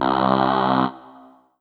Yamaha TG500 CH Vocod C2

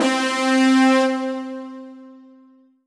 Ensoniq VFX SD Fat Brass C4

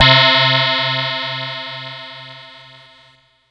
Yamaha PSS-170 Honky Tonk Clavi C3

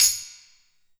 Tambourine

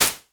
Korg DS8 Slap Noise

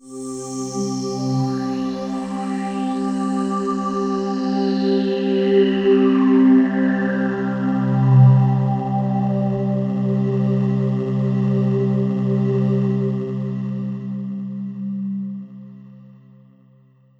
Roland JV-2080 Spaced Vox C3

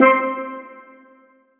Roland JV-2080 Steel Drum C4

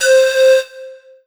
Roland SC-88 Blown Bottle C5

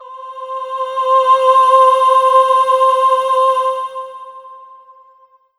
Roland PN-D10 Slow Voices C5

